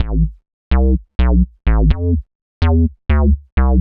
126 A F BASS, Funky Underground bass loops; Loopmasters; Funky Underground Vol 2

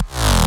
Bass Shot D#; Mutekki Media; Vengeance Electroshock Vol.2

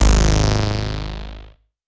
Bass Shot G#

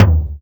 Toms

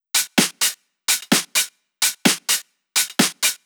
Loop, 128 BPM

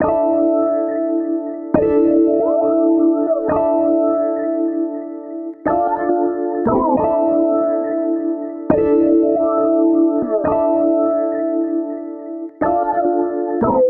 Bpm138 Onyx Piano Loop Am, Dubstep

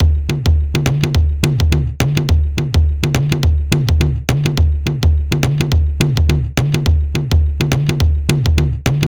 105, Breaks, 105 BPM; loops; Loopmasters; Jon Carter & Alex Blanco; Block Party Essentials

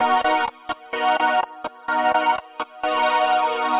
126 MUSIC; funky; Loopmasters; Funky Underground Vol 2

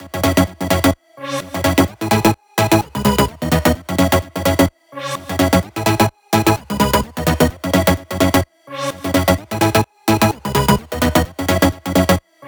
Electro House Melody; Mutekki Media; Vengeance Electroshock Vol.2; Electro House Kit 1

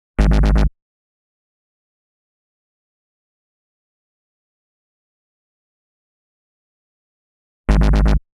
122 B#m Bass, Complex Electro Synths; Prime Loops; Specters

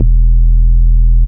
Bass Shot E

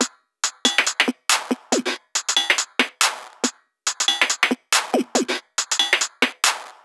Bpm140 Perc Loop, Dubstep; Optimum

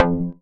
Synth Shot F; Mutekki Media; Vengeance Electroshock Vol.2

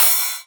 Ride, Cymbals

